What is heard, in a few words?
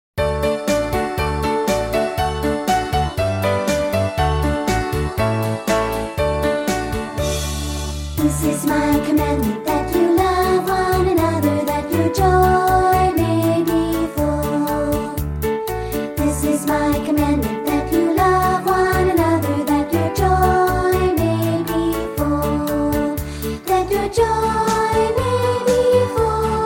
Childrens Choir